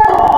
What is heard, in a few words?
Rooster; Cymatics; Serum; Animals for Serum - Gold Edition